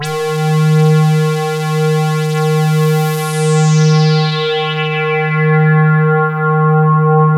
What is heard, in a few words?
LUCKY SWP, SYNTH; Keith Emerson Signature Series